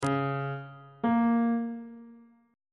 relative minor of d flat major is b flat on bass clef